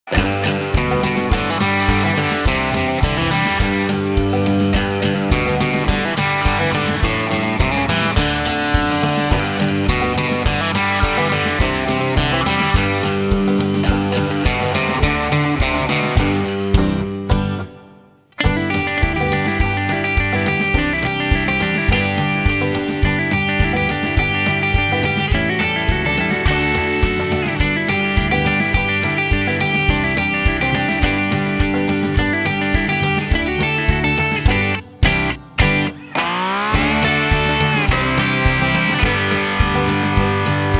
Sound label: trail ride groove